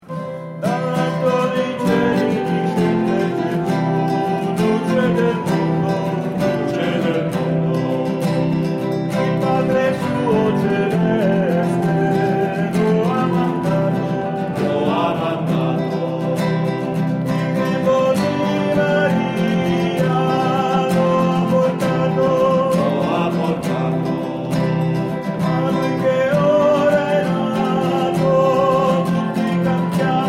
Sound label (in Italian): Soprani, Coro; Dall'alto dei cieli